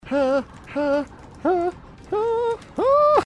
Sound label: huuuh